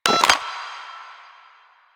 Pager Close; Arthur Pager

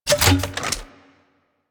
Flak Cannon Reload; Warframe; UT Flak Cannon

